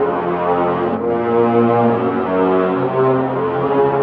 strings tragic; Sad; Loops

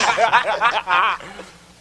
laugh group of men; ooh ahh

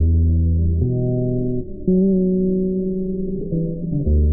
bass

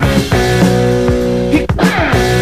guitar get down; Loops; Phonky

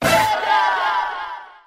stab and sing

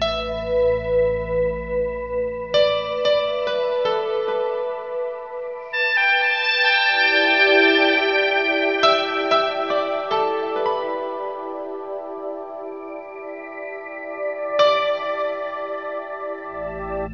sax noodles, Sad; loops

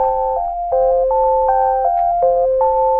keys, Sad Loops

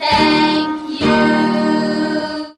thank you kids sing; Voice; expletives

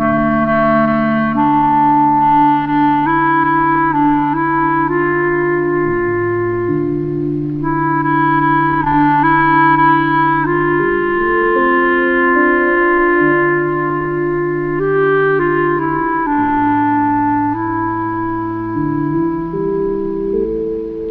sax dreadful, Sad

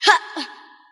"ha", Voice

